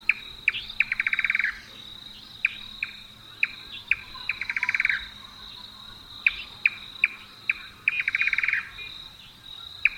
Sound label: Indian Nightjar